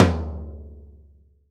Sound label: POP F. TOM; VELO TOM 1